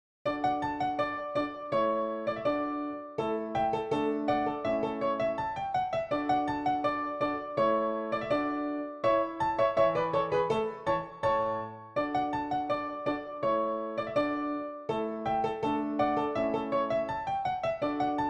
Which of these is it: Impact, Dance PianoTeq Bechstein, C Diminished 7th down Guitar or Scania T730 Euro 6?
Dance PianoTeq Bechstein